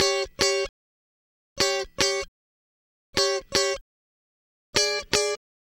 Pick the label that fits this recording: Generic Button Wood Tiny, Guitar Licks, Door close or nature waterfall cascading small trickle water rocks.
Guitar Licks